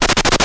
Run; Quest for the Sword of Justice

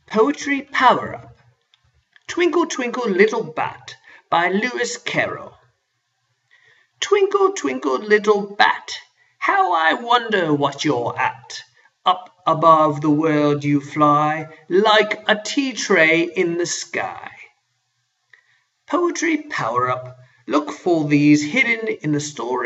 power up bat; wobbles; tutorial

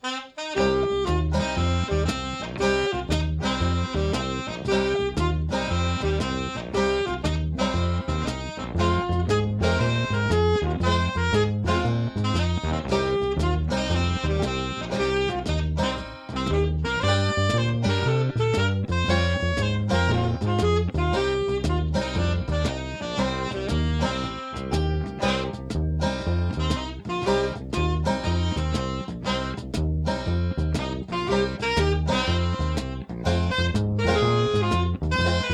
groovy swing; Detectiveland

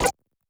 Cursor; Quest for the Sword of Justice

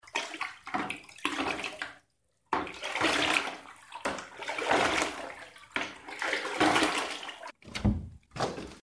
Flood Open Door; Bad Water

